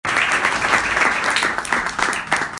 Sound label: claps